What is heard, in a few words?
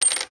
coin; breakhack